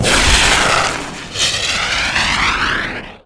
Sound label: rpg away; weapons; bullets